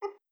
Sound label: boop A#